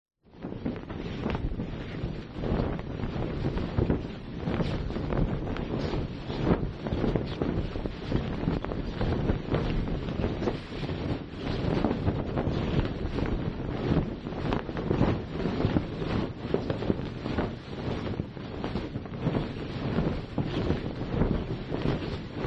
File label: vehicles boat sailing ship yacht sail blow wind; zapsplat